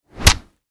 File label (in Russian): udar